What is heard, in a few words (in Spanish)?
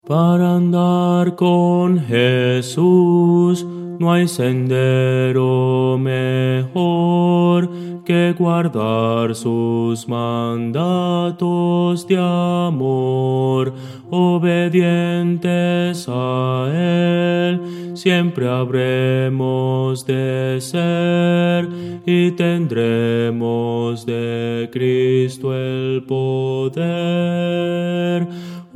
bajo, himnos cristianos